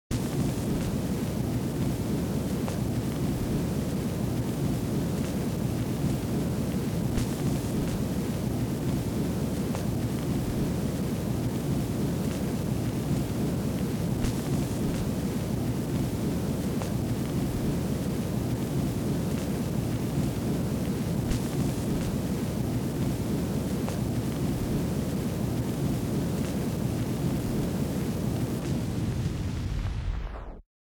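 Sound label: Fake Vinyl Slowdown End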